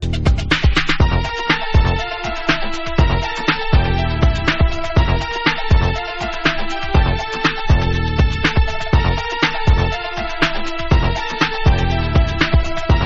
Break Dance

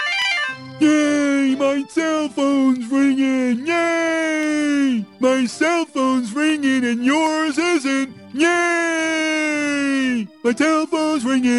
Cell Phones Ringn

